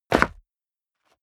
step leather shoes concrete grit jump; schritte

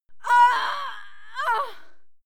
female die moan heavy; schreie; menschen

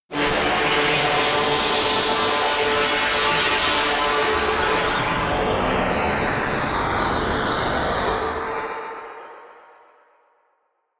cinematic resonator string; energie

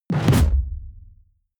sci-fi airship deck gun; schusswaffen

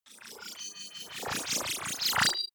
wobble hover swell long; energie

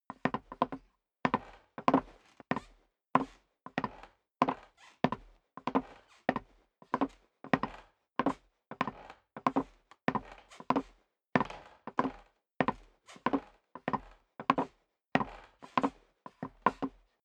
step boots wood creak downstairs sequence; Footsteps; schritte

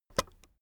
keyboard external space release soft, computer; kommunikation

